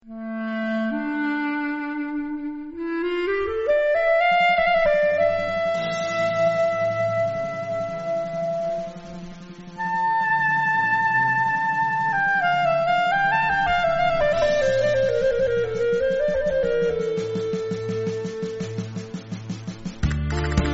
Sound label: Playback + 1 Alto Saxophone